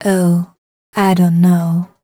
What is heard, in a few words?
f mel g, fem Vocals